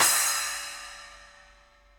Splash 8 Dark Plate Verb, Cymbal; Drums; Drum Hits; Standard Hits; Ableton; Factory Packs; Session Drums Club

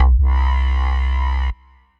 Bass; Tumaque Bass; Ableton; Factory Packs; Skitter and Step

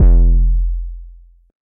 Low Dirty Kick; Percussive; Ableton; Glitch and Wash

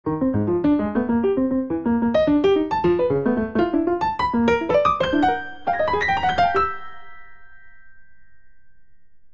Phrase Free Monophonic 77 bpm, Grand Piano; MIDI Clips; Ableton; Factory Packs

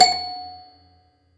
hard F#5 127; Ableton Foundation FreePacks; Foundation Glitch Toys & LoFi

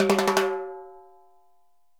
Timbales A H Roll; Latin Percussion; Drum Hits; Ableton; Factory Packs

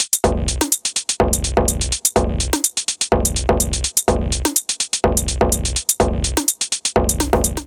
Tops 125 bpm; MIDI Clips; Ableton; Factory Packs; Skitter and Step; Flex

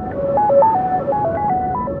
Windy Melody; Tree Tone Presets; Press Keys; Ableton; Factory Packs; Inspired by Nature; Dillon Bastan

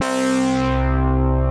clav; Poli; Clav Lotus

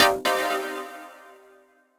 Deep H Chord, Synth Keys; Ableton; Glitch and Wash

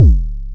modular deep, Kickbank; Ableton Foundation FreePacks

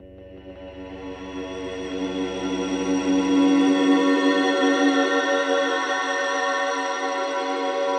Pad, Drone; Dusty Mind

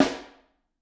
Snare Overhead

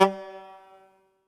PLASTIC TUBES; Convolution; IR Samples; Kontakt 5

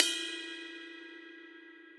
RIDE 22 cup; RIDE PE TL 22 cup; Ableton; Factory Packs; Drum Booth

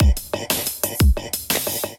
Kit, Drums; Wayman Kit; Ableton; Drive and Glow

